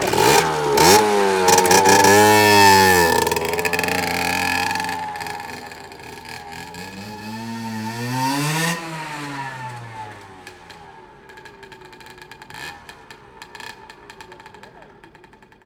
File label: motorbike start go, street traffic; best service Blue Box